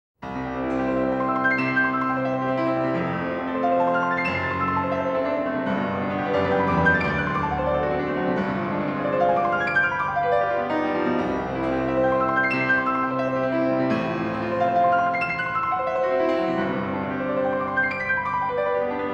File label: Etudes for piano, C major; Chopin; The Complete Études; Chandos